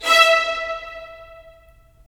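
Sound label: strings violins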